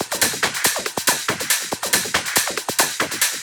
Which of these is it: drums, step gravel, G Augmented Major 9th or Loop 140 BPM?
Loop 140 BPM